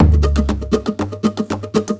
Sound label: 120 PERC